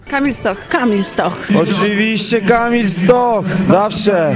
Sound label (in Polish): kibice